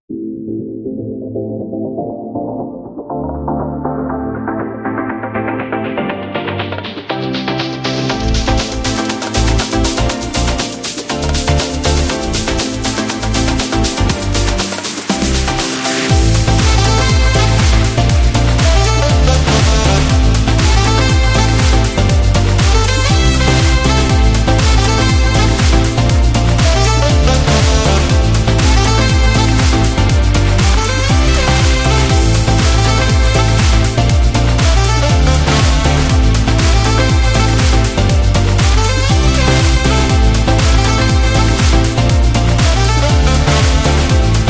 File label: Fashion Catwalk